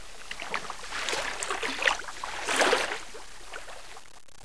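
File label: water ambience; valve; coil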